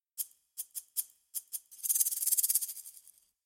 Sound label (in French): Maracasses